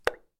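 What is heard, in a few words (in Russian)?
вода капля мягкий удар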